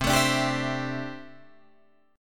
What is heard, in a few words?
C 6th Add 9th C down Guitar Drop D